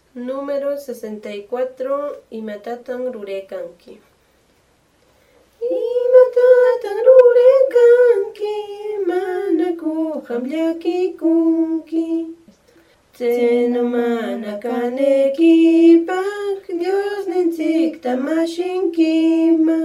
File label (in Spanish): cancion